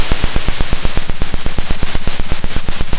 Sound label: pop, Sound of a drum roll